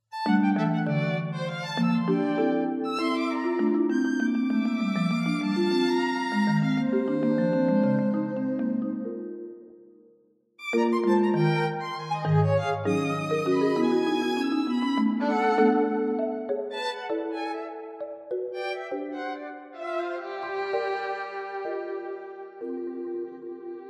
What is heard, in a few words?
violin